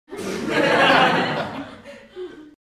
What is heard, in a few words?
laughing sitcom; Laughing Sitcom Audience